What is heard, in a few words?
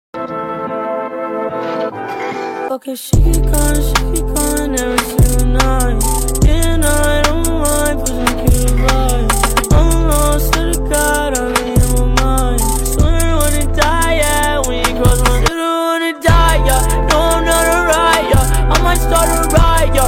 remix ringtone, english ringtone, sad ringtone, bgm ringtone